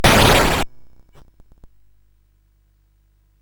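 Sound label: Nebulus, MOS 8580 SID chip music, games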